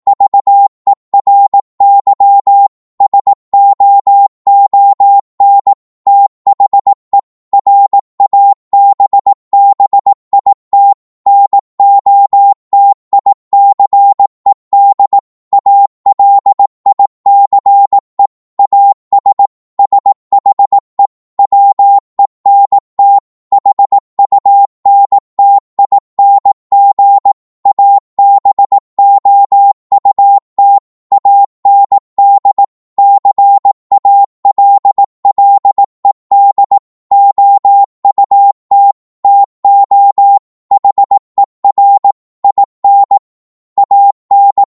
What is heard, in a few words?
18wpm, Morse